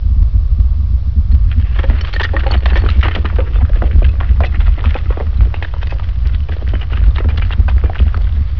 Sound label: Earth Quake Rock